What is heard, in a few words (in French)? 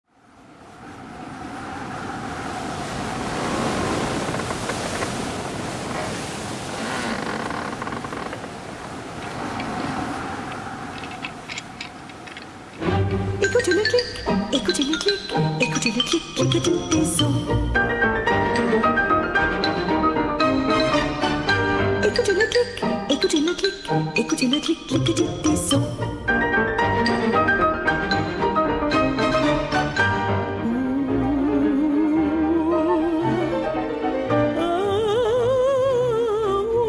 Les Fossiles vocale